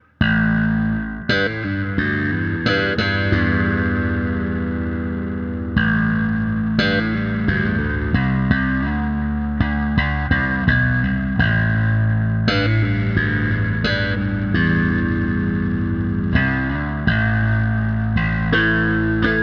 Channel Mix Chorus Delay

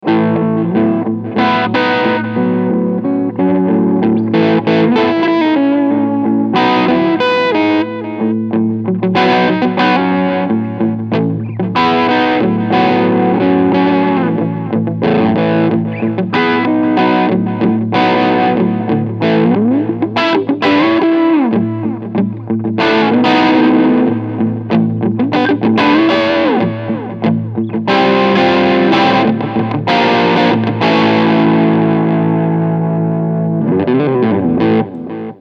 Low Gain Delay

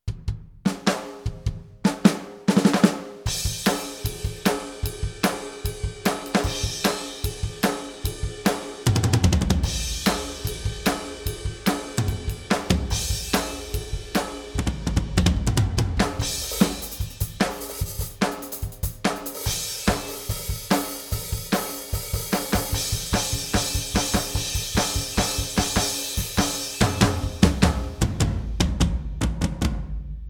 PEARL eMERGE

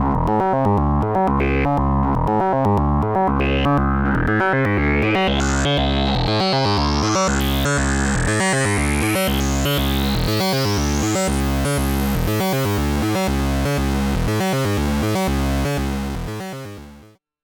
Reon RE501-R Driftbox-R Classic Sync w Filter

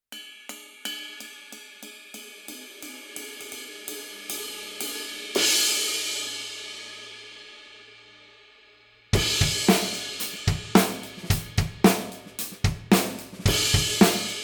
21 Traditional Medium Crash

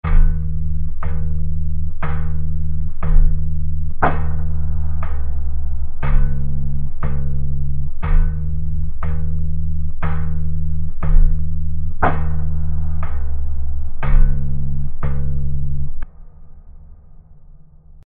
HalfTime 4x

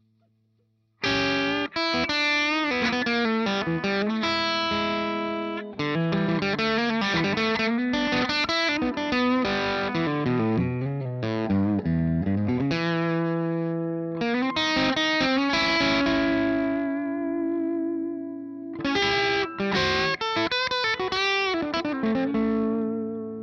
Peerless TMC5 Blues

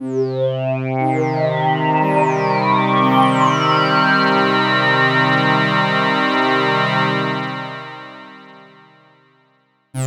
Filter serial parallel complex

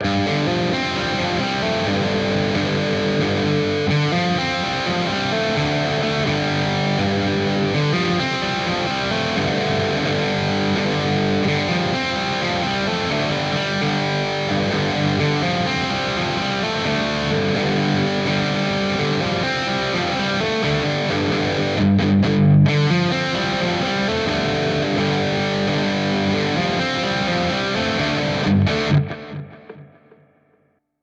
Neural DSP Quad Cortex Neural Capture Bogner

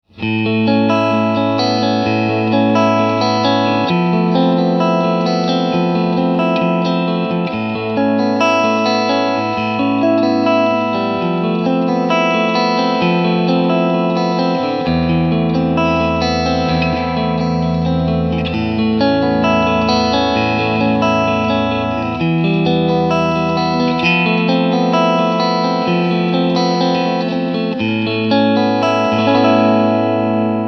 Clean Channel Picking

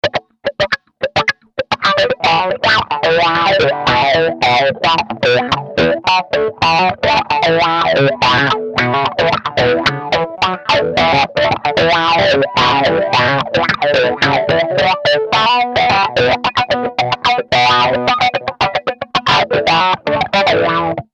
disco

